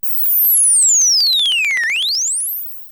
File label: SWEEP Z; AMG - Vince Clarke Lucky Bastard